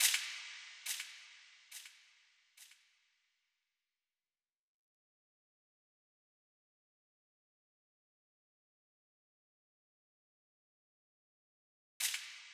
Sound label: Drum Loop - 140 BPM Impact, Drill; Loop Stems; Cymatics; PARADOX II - Drum Loop Collection; Bow